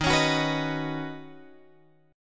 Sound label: Eb Minor 7th Sharp 5th, down, Guitar, Drop D